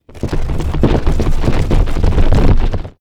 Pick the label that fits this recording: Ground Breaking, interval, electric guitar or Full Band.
Ground Breaking